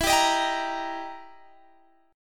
E Minor Major 7th Flat 5th E down Guitar Standard; strummed; JGuitar

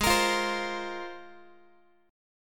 Ab 6th Add 9th down Guitar; Ab6add9 strummed; x,16,13,15,16,x; 4A,4E,5A,5D,5G#,6C#

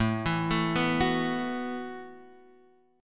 E Suspended 2nd Suspended 4th A

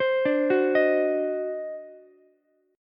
D 7th Flat 9th, Ukulele C6; arpeggiated; JGuitar

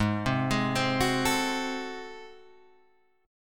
Ab 7th Flat 9th Guitar Open G, chord

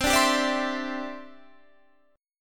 E Minor 7th Sharp 5th C

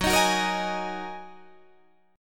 F sharp 6th Add 9th Guitar Open D, chord

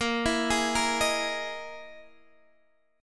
E Major 7th Flat 5th chord

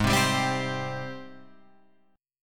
Ab Major 7th Ab down Guitar Open F; AbM7 strummed; JGuitar

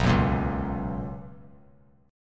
B Major 7th Suspended 2nd, chord; BM7sus2; strummed; JGuitar

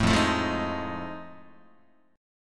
F 7th Sharp 9th Guitar, chord; F7#9 strummed

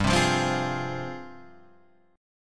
F Minor G, Fm/G chord; strummed; JGuitar

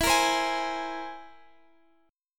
C Augmented 7th E

